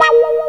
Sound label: GTR WHAHAH; Hip Hop; E-MU Formula 4000 Series Vol. 1; Hip Hop Nation; Funky GtrLicks